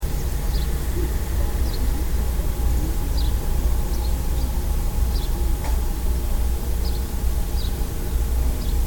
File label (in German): haussperling